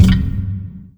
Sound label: fs fm lightbridge, footsteps; Portal 2